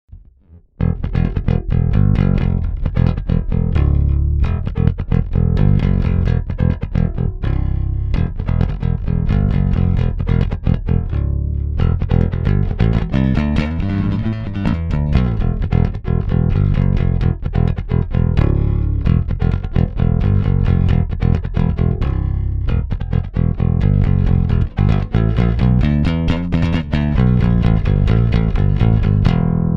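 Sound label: Ibanez SRX756 Plec; IBZ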